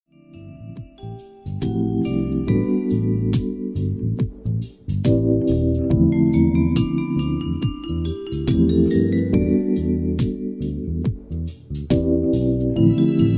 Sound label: smooth jazz cafe